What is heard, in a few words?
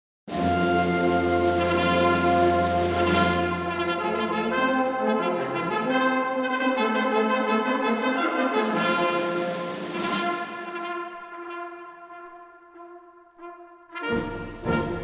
Marche Danoise